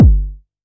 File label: strong bottom end bass drum sample g key, Royality free bass drum tuned to the G note. Loudest frequency: 184Hz